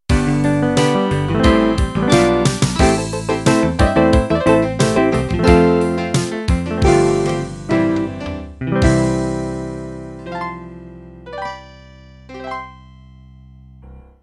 country-pop ballad end

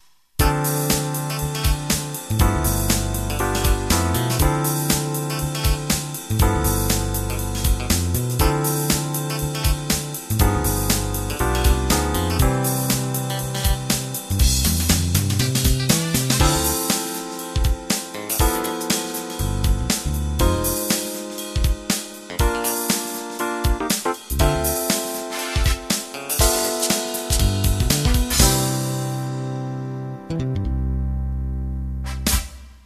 Jazz Funk

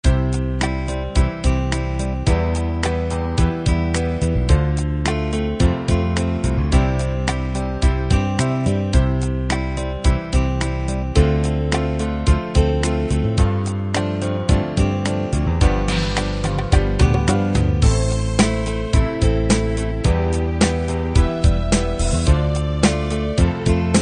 love 8beat

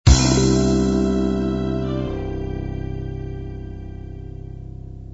disco miami end

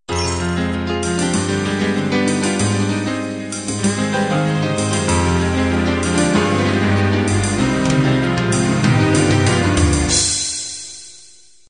folk ballad intro

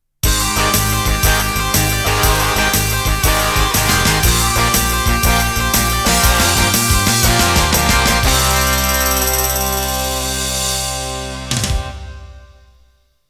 Shuffle End

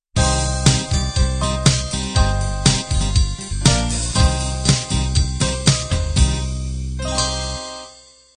bubble gum end

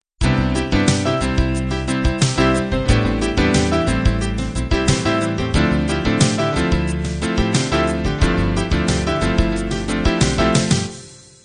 piano jazz-pop intro

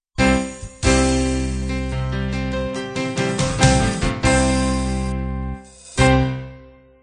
piano rock end

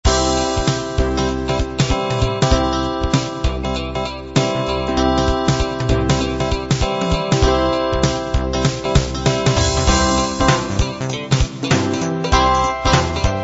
r b shuffle

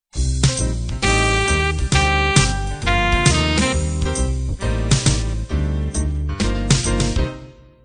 cool jazz intro

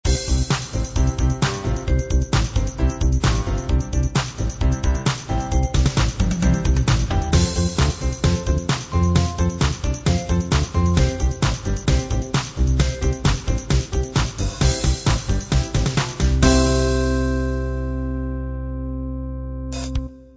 motown

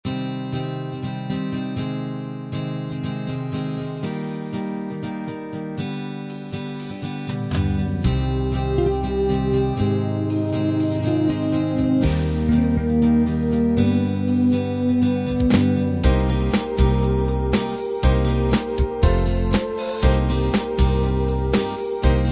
classic beat combined

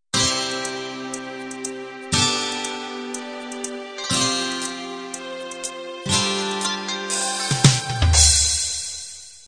6 string beat intro

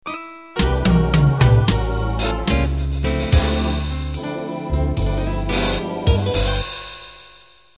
Slow Jazz Intro

